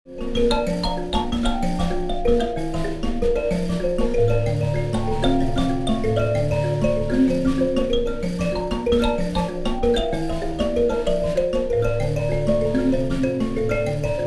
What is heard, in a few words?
mbira